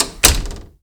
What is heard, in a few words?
WOOD CLOSE, DOORS